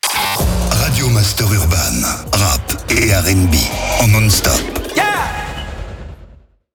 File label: JINGLE RADIO; RADIO MASTER URBAN; Habillage